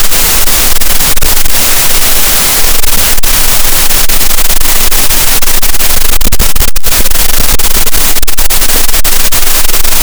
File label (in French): cachalot, Physeter Macrocephalus, gaussian noise